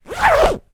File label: household cushion zip; zapsplat